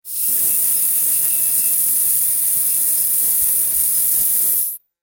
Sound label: Electrical Whine; electrical interference